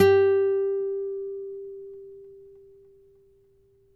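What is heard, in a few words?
nylon, FLAMENCO, Guitars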